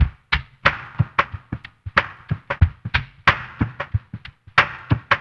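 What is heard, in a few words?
cave loop; Spectrasonics; Liquid Grooves 1 & 2; CAVERN SW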